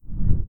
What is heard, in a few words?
Heavy Object Slide Woosh